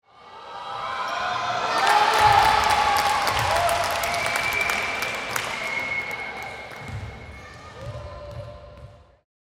Mixed Reaction, Boos and Yells, Applause, Whistling; Sound Ex Machina